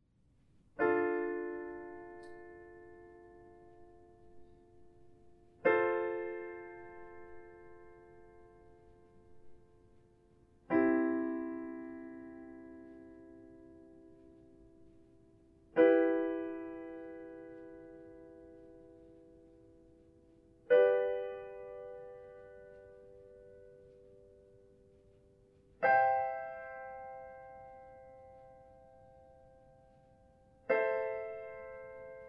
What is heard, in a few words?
Secondary Chords